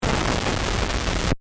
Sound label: Distortion Crackle; BlastWave